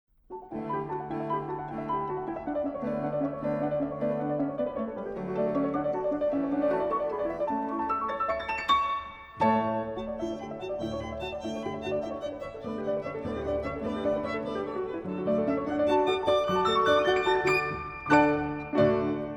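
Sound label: piano trio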